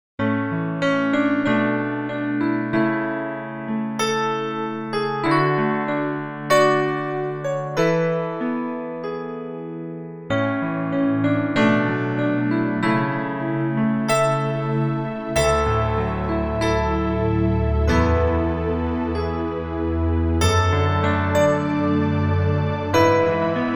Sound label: Ballad, epic grand